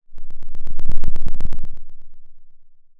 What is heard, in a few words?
pop